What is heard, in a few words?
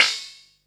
SNARE, INDUSTRIAL